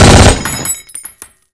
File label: mg42 fire